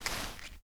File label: sand, step